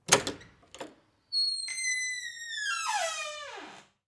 porte qui s'ouvre; deambuler

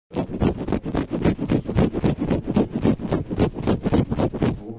gratte

